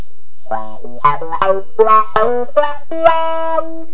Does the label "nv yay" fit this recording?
No